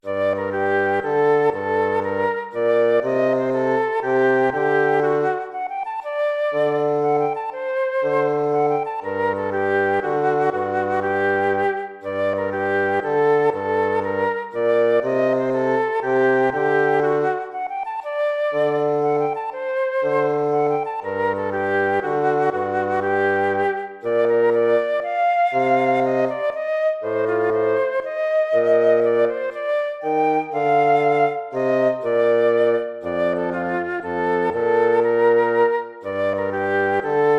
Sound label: baroque minuet minor